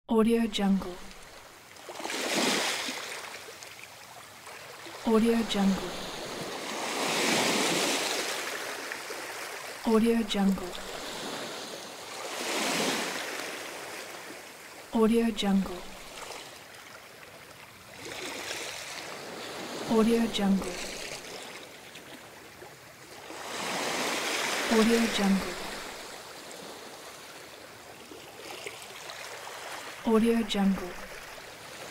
waves ambiance; soundforces

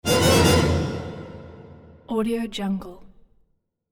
symphony action reminder accent

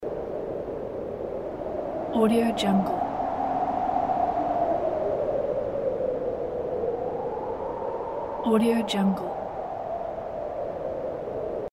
cold wind

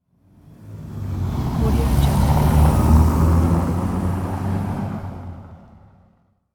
car passing by

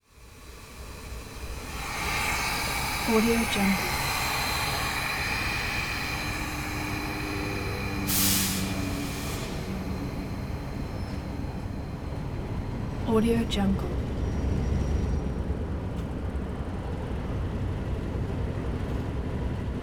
train short start stop; collanderson

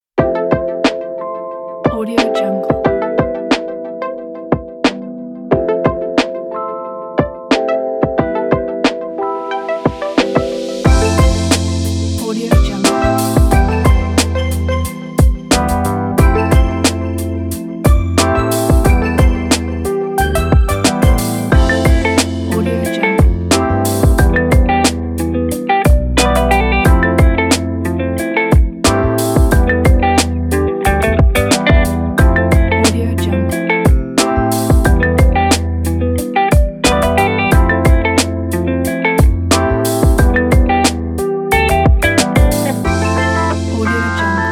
sunny day chill bap hop

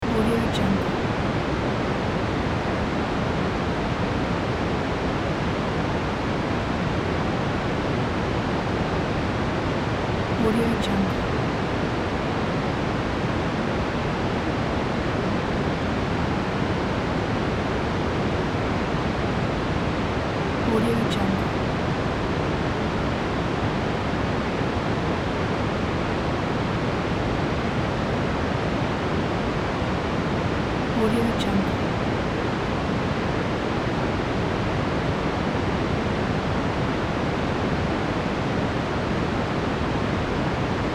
hot dry wind medium loop; magicsoundeffects

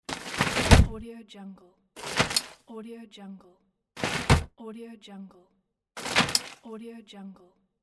umbrella

